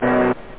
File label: wierd drum, drumkit; OctaMED SoundStudio V1